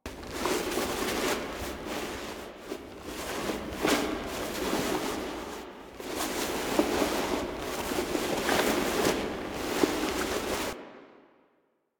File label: Cave Foley Loop; Teshin; Warframe; Duviri